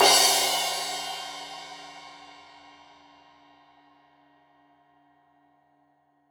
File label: Crash 15in L edge, Cymbals Stereo